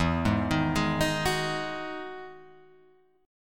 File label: E Major 9th E down Guitar Open G